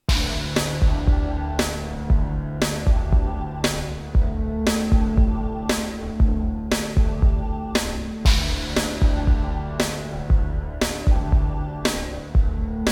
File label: Ensoniq Soundscape Elite hardware; soundcardrecordings; Abuse